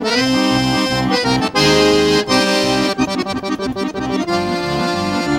ACC LINE, MELODIC; Best Service; World Colours

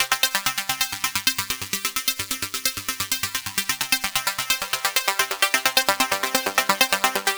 130 C synth, Synth Loops, Trance; Trance Explosion Vol1

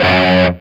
G3 SOLDANO ROCK, Guitars; Spectrasonics; Hans Zimmer Guitars VOL-1

